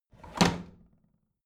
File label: bathroom drawer close heavy